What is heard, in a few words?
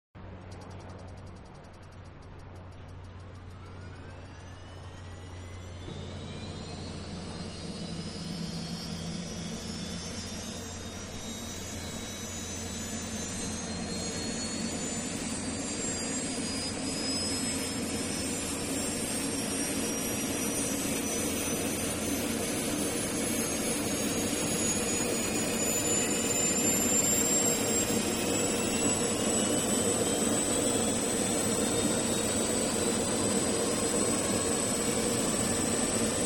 Helicopter Military-Idle, Then Lift And Landing; Helicopters; Manhattan Production Music